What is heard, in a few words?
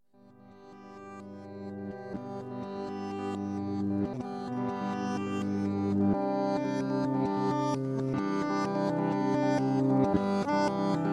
guitar